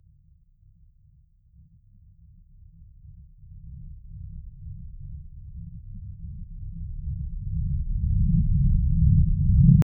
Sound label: psychological horror atmo, paranoia